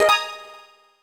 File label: Completion; Super Smash Bros